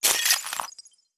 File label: Freeze